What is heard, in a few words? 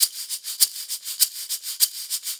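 Hip Hop Shaker, 100 bpm; Percussion; Digidesign; Pro Tools; Pro Tools Essential Loops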